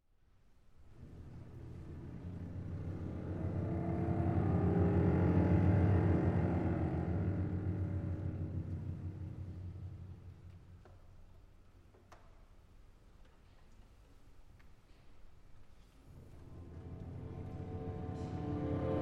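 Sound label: Chamber Orchestra; Irish Chamber Orch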